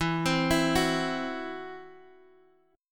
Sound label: E Suspended 2nd Sharp 5th E, Guitar, Half Step Down